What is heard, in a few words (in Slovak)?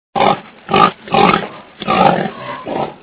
prasa; zvierata; domace